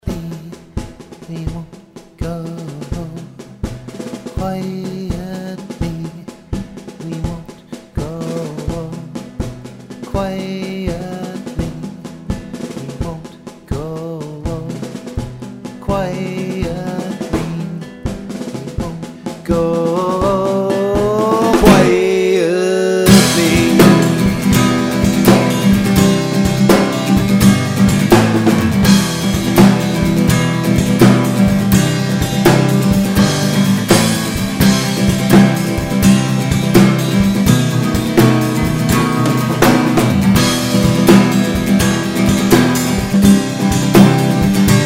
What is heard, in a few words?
drums; healthy harry drums